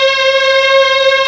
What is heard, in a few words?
MEL STR C#4